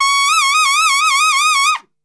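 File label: C#2 trumpet shake, Brass; Brass Super Section; TRP SHAKE; Best Service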